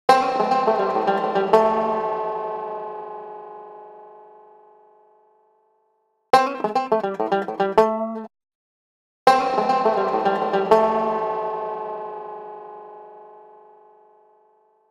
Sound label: H910 dual harmonizer